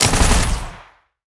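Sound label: handgun, attack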